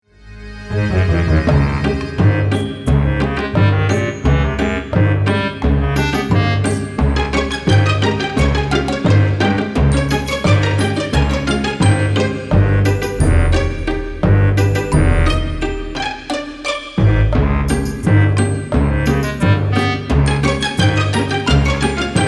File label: Footsteps of a Bear